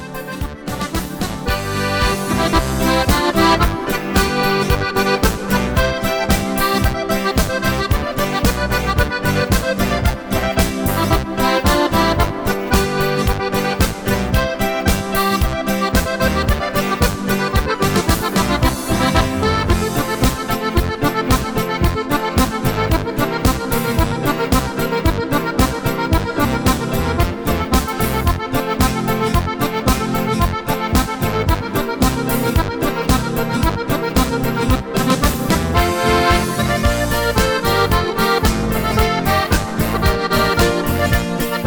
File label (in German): Timple Boarischer